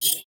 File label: sci-fi sfx